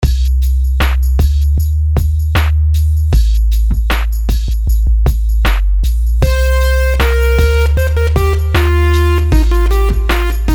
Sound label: melodies simple 16s Cmin; melody